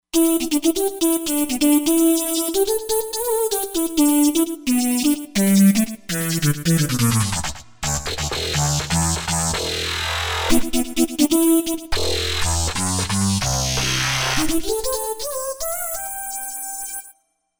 Air Stabs

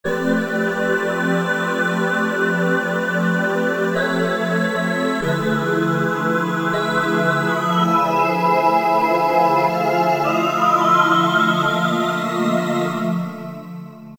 Pad Backing Vox, Alesis Micron; Factory Programs

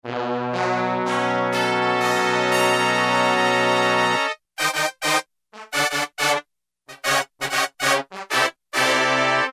Brass, Roland U-110; Factory Patch

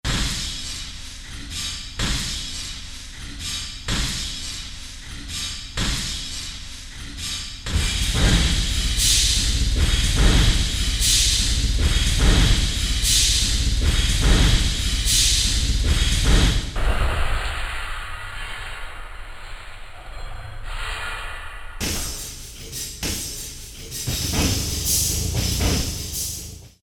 Industry, Korg DSS-1

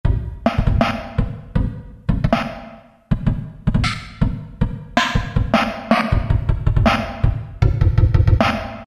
Drums Set, Roland PN-D50-02

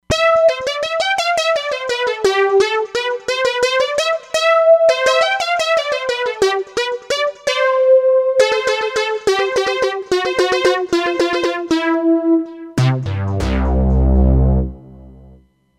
Modular, Roland Fantom XR

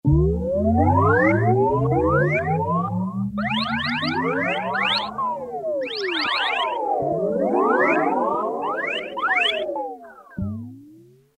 Sci Fi Sweep; Roland M-DC1

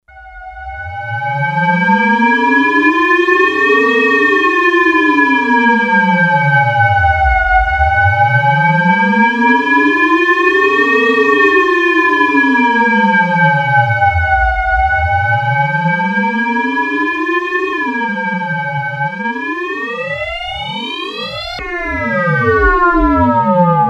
Alien - unison, Sequential Circuits Six-Trak; Factory Programs

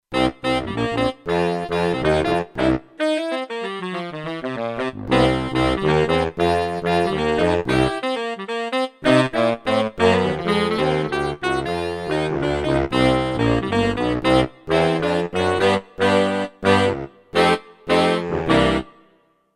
Sax Section, Kurzweil K2500X; Factory Programs

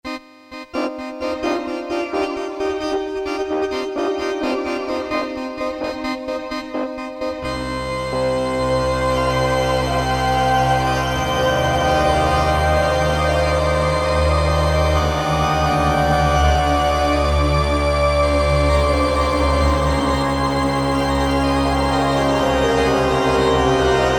organ + Quadraverb2, Casio MT-68; Quadraverb2 ''Dream Stalker''

